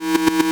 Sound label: Science Fiction Sci-Fi Electronic Fast Pulse